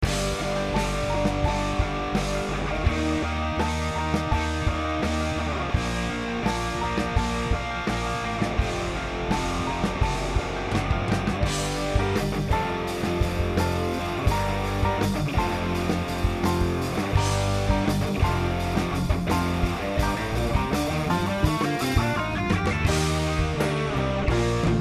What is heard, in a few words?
g12k100 greenback; vh4